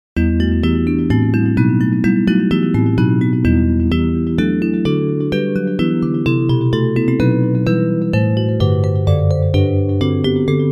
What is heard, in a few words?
Bells